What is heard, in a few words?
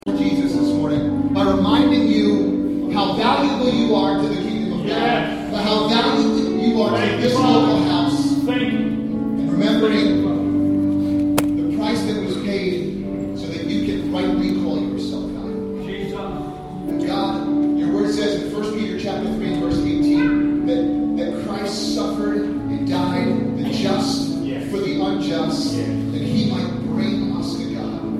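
service ambience; HUDSON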